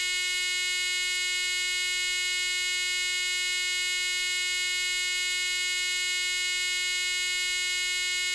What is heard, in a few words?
Electro-Mechanical; Transducers USA